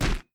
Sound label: secondary hit; Skillzgaming; battle Mania Desktop